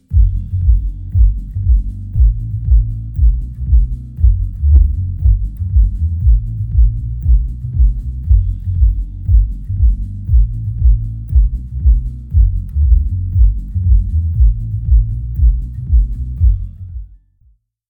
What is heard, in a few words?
kick